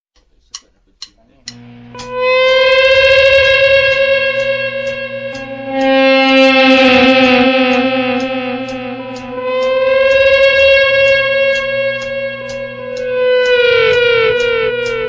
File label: Loud Sound